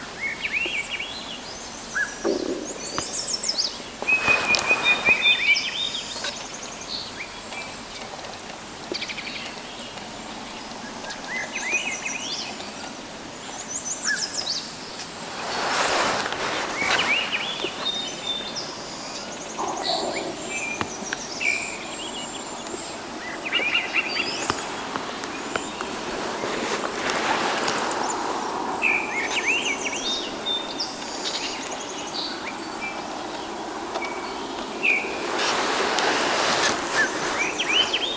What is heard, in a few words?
Yellow-breasted Chat duet with Swainson's Thrush; birds